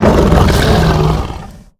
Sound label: Death; Salhets Prideleader